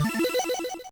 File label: game start; Namco Museum Archives Vol. 1